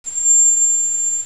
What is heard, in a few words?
trumpet